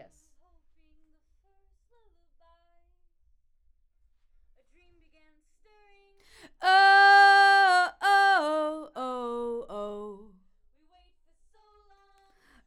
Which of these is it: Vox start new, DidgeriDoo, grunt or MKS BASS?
Vox start new